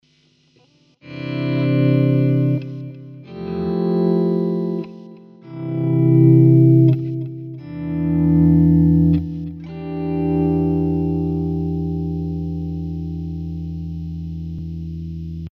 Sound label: Auto volume echo